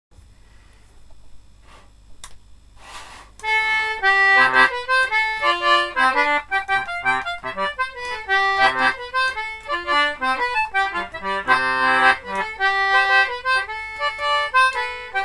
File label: family jig, concertina; faking examples